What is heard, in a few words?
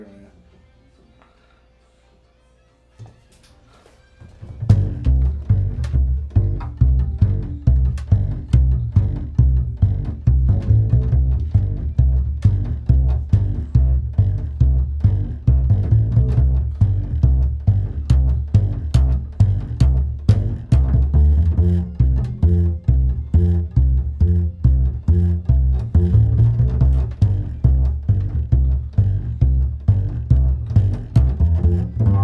bass